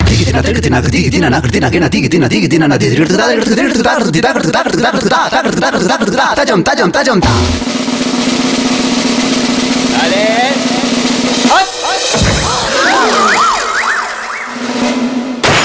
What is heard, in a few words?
jingle